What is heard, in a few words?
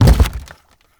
Gas Tank Impact; Gameplay; Warframe; Nineteeen Ninety Nine; PvPvE